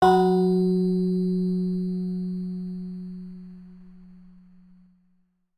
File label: bell